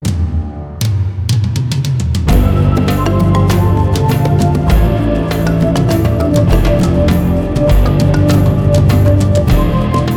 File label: Animated Jungle Theme